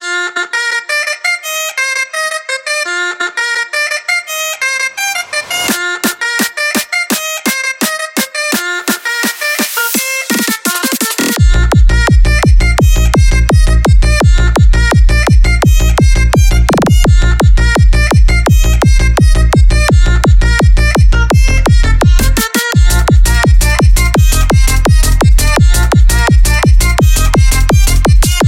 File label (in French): BOMBARDE; PERCEVAL